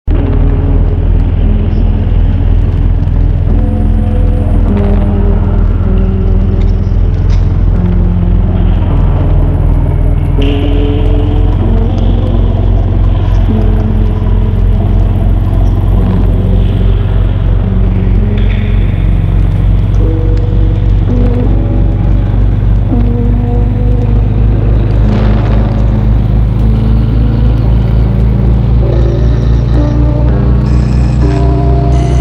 HORROR ATMOS, pad

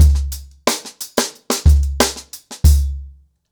90BPM, ACOUSTIC DRUMS

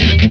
CHUGG IT, Distortion Gtr; PlatinumPhattCD2; E-MU Producer Series Vol. 8 – Platinum Phatt

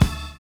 FILL; Univers Sons - Kitch 70s

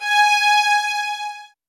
SOFT VIOLINS

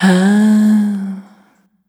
Vocal Samples, Single Voice Melodic Loops; Singles

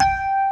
RHODES; Pro-Rec - Synthsonic

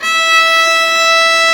E4 LA, STRNGS; AKAI S-Series CD-ROM Sound Library VOL-8

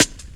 Tin Cut, Jungle, Drum Loops 165.5; Zero-G Jungle Warfare 1, 2 & 3

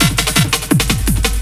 LOOP SD, Drum & Bass, 170 BPM; Ueberschall; Drum & Bass Flash Trackz

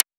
Woodblock; LIMAT Div Dept; Drums

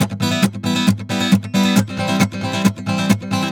MERENGUE, World Grooves

